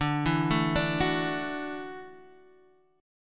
F sharp Minor 7th Sharp 5th D

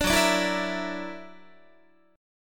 F sharp Augmented 7th, down, Guitar; F#+7; 17,14,17,14,14,15; strummed

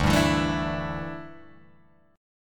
D Suspended 2nd Suspended 4th, Guitar, Open G

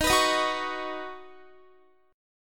Eb Minor 7th Sharp 5th Eb Guitar; Ebm7#5 strummed

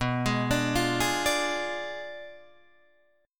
B Diminished 7th B down Guitar Open D

